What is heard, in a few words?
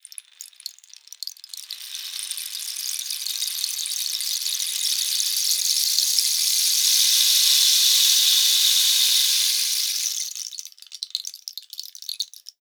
RAIN, PERCUSSION; Best Service ProSamples vol.42 - Session Instruments; PS-42 Session Instruments